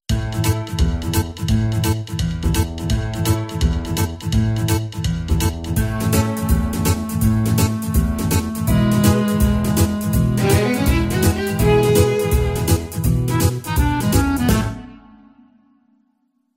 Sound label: Instrumental; Donkey; academy players audition materials